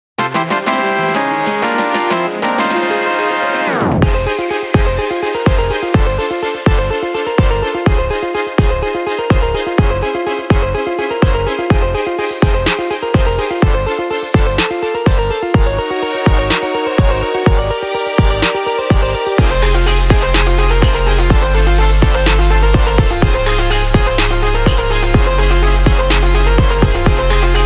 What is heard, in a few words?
Victory Funfare; FF7